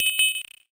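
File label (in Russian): уведомление об ошибке устройства камеры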